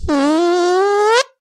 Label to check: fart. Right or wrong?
Right